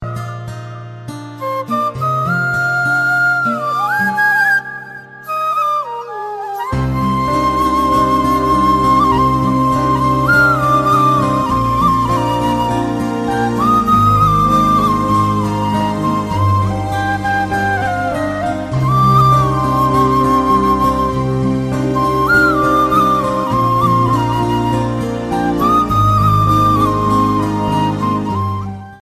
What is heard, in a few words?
flute ringtone